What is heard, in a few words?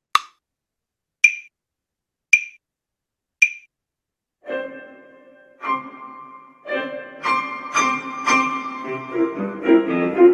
Can Can 110 bpm Accomp